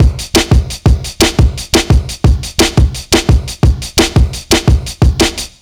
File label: 173 BREAK, Jungle; USB Soundscan vol.05; Explosive Jungle; AKAI; 90s sample CD